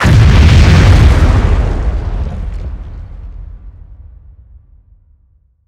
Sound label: Explosions Explode Ground; DCS